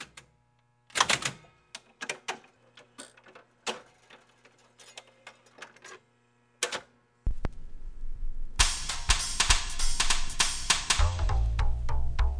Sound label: Juke Box Song; blair witch volume i rustin parr